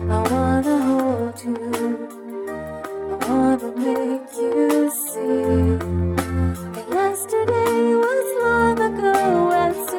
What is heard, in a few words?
vocals